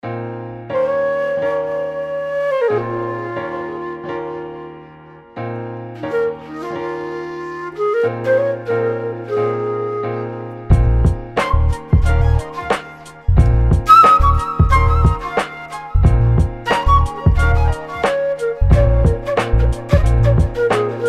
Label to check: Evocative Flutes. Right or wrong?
Right